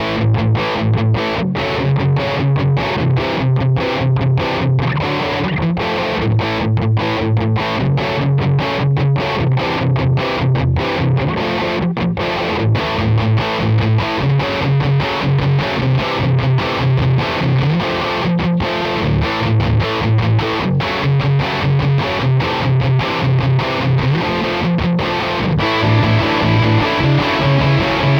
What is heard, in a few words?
Source Audio EQ 1 midscoop